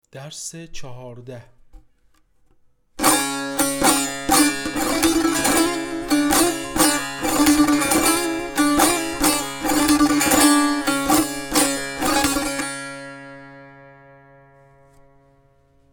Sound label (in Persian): مشق تنبور; تنبور جلد دوم; هفتاد و دو مشق تنبور